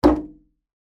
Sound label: household metal radiator knock; sound effects one